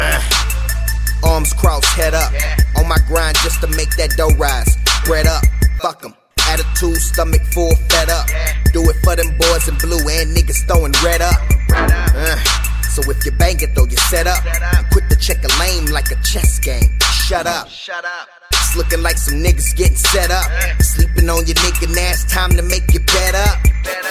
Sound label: seneez; ringtones; sms